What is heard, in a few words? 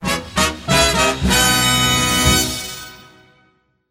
Upbeat Talk Show Ender